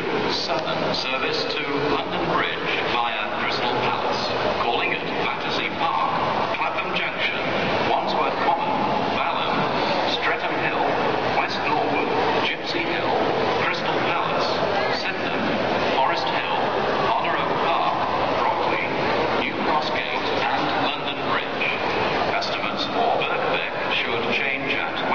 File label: Classical